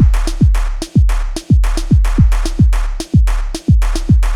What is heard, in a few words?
Fill, Drums, Eclectic Electronica